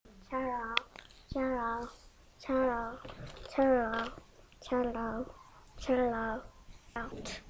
cello

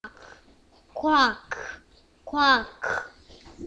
quack

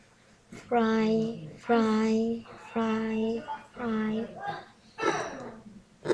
fry

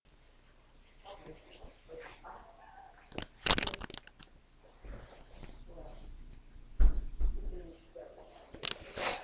phone

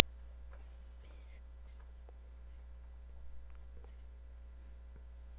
basketball

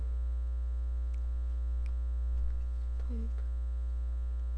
pipe

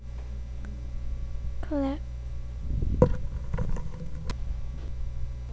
clap

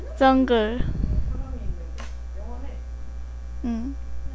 jungle

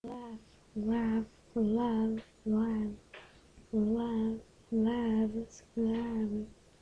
laugh

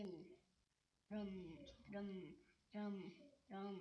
drum

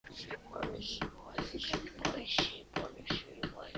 pad

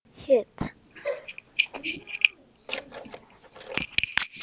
hit

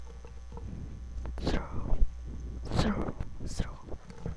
throw

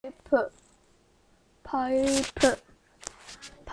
pipe

